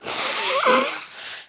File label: Nose Blow Male